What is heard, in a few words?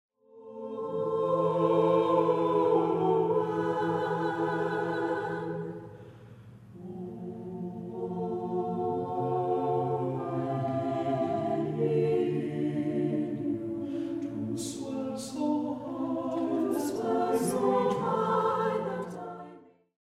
weep